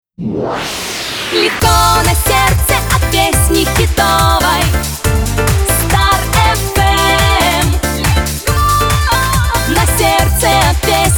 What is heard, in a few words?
radiojingle; ru starfm